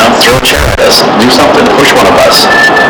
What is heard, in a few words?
whistle